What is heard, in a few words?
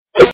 Notifier